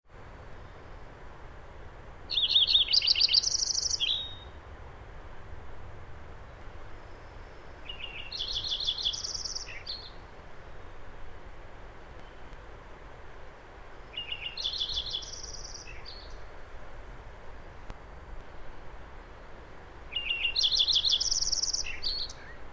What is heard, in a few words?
lincoln sparrow